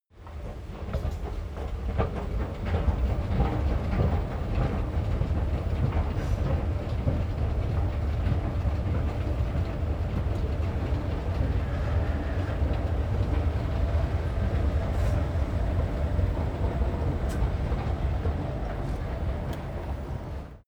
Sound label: escalator take upstairs